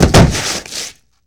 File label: Body Fall On Dirt, Hand To Hand Combat; Sony Pictures Sound Effects Series